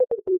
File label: Low Battery